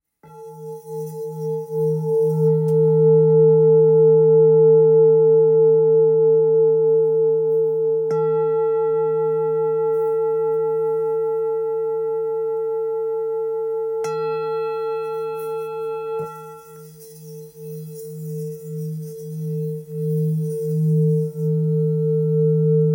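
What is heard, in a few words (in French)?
Bol ancien la, bols chantants tibétains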